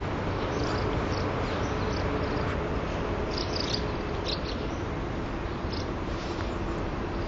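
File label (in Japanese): iwatsubame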